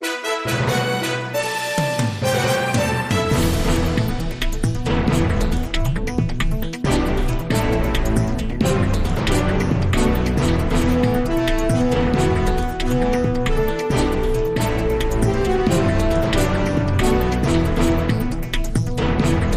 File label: Breaking News Bumper